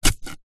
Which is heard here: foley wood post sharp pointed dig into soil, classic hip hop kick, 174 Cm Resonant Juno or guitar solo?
foley wood post sharp pointed dig into soil